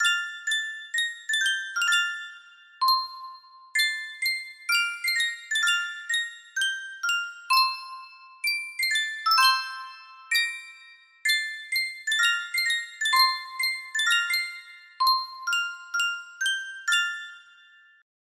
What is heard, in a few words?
Sankyo 12 note music box